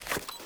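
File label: inventory pda on; STALKER; IWP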